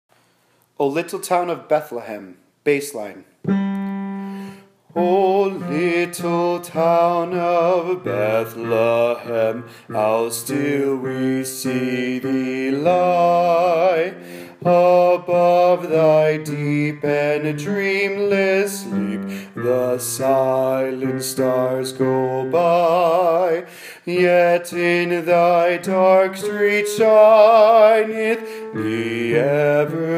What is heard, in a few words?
bass oh little town